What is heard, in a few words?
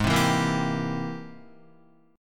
G sharp Augmented Major 9th G sharp Guitar; G#+M9 strummed; 3,3,4,3,4,3; JGuitar